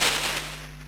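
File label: Dungeon Encounter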